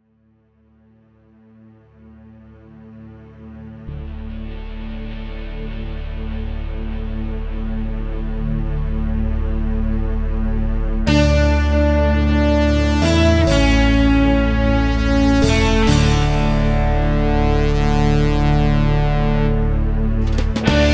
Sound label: fanfare